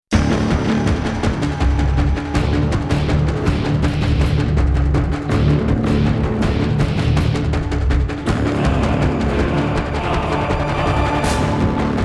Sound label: Hellicopter Hell; Transformers: Revenge of the Fallen